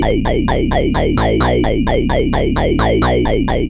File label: Juno-60 Arp, 130BPM; musicradar; bitcrushed; Crushed Loops